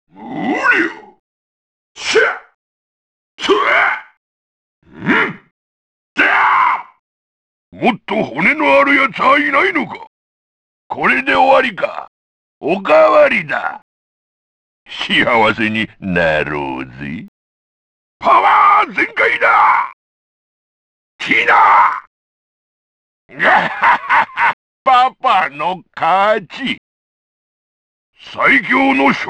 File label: Bass; Dead or Alive; PlayStation